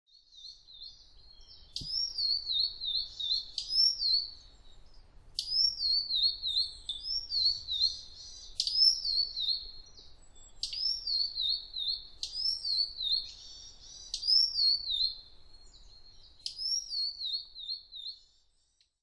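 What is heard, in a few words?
Blue-and-white Flycatcher; chichibu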